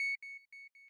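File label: square lead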